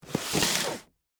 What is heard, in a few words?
Cardboard Box Slide, Foley, Cardboard, Box, Slide, Full; BlastWave